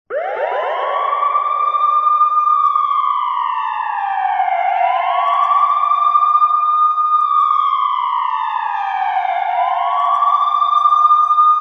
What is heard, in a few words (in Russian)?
sirena